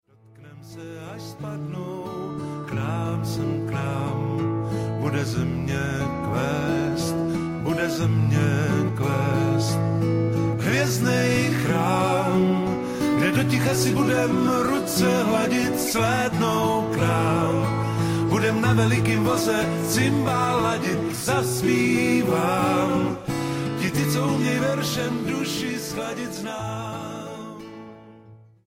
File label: Cimbal